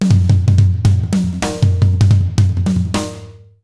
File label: 160 B BEAT, Jazz Latin Drumloops; USB Soundscan vol.08; AKAI